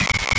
Glitches; Antidote Advent

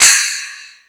Crash, Drum Machines E-MU Pro-Cussion

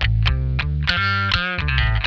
FULL OCT, Funk Guitar; Zero G - Funk Guitar

